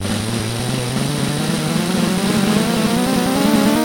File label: Casino Slot Machine Winning Count Begin